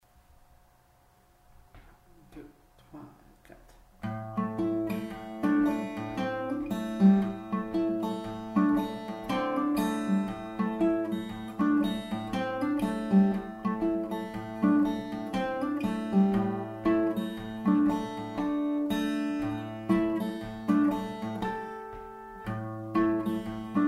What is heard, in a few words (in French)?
la la le ri arpeges